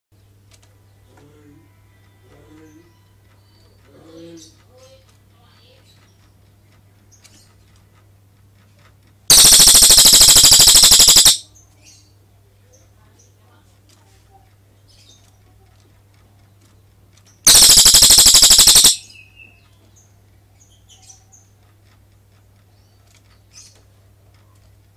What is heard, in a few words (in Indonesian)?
Suara Cililin Ngerol Panjang